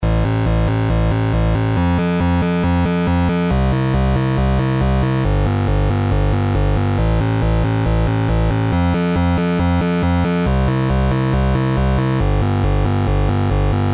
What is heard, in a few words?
Control Room